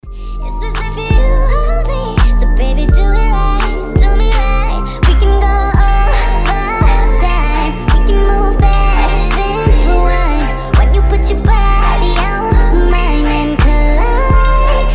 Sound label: sped up remix; чувственные